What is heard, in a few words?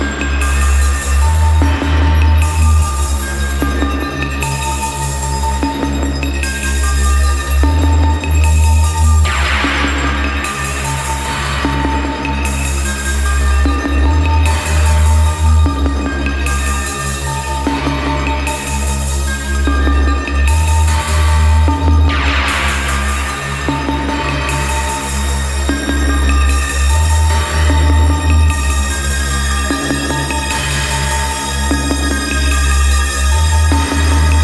II (Chimes)